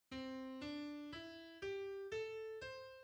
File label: Pent Major